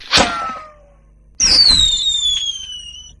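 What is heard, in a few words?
fire arrow launched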